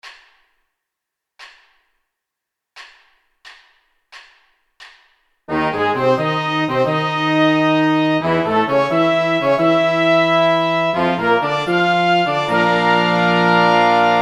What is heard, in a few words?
Charge, pep music; marching